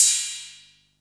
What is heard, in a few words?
Ride, Drum & Bass, Hats & Rides; AMG - Prototype Drum & Bass